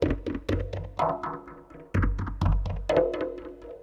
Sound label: PERC LOOP ETHNO 125; eJay